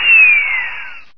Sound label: turret spin down; source engine; hl1; video games